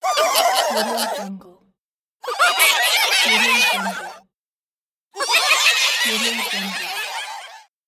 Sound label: crowd laugh